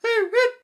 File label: bird; Syrinscape unplugged; Jungleplanet dinolaser battle